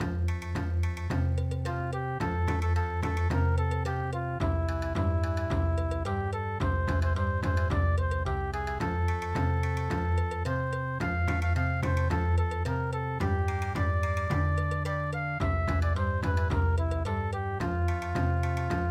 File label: Bon Odori, midi